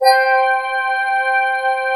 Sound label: BEAM C5; Best Service Dream Experience; SYN-BEAM